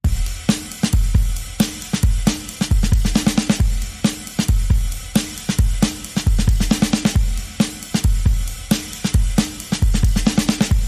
Perc Only

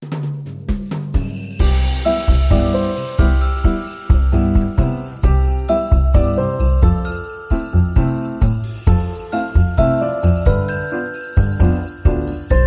Jazzy Jingle Bells

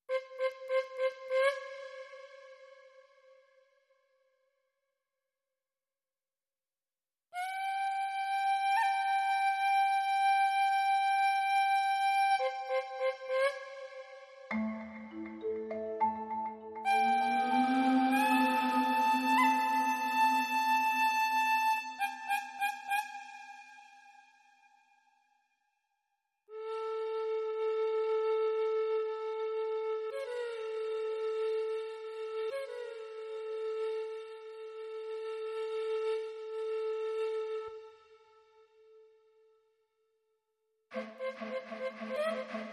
African Wilderness, Melody

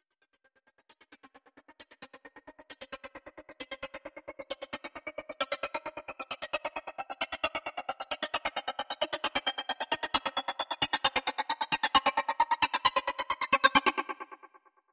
Glitchy Riser

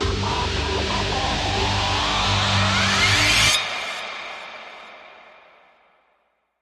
Trailer Riser

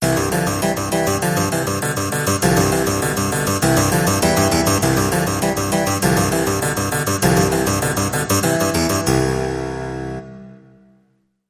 Harpsichord Classical Fanfare

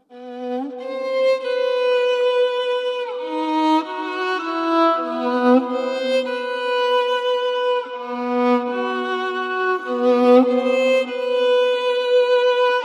Only Violin

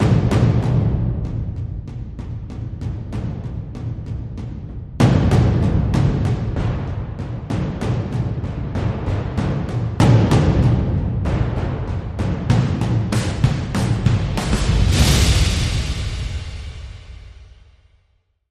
Restless War Drums

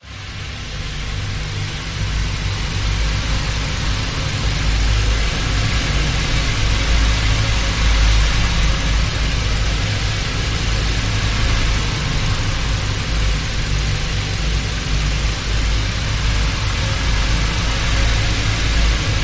Speedway Atmosphere

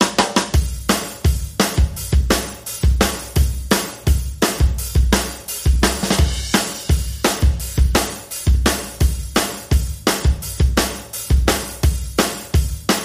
Drums Only Mix

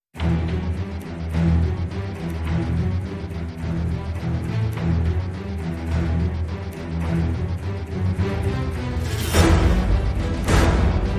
No Choir No Horn

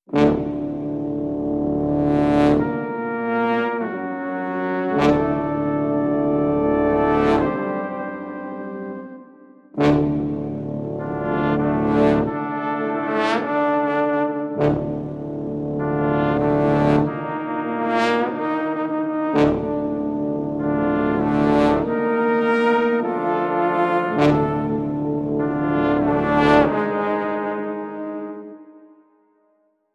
Tension Horns

